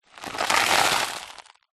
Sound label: dirt debris small in plastic bottle tip movement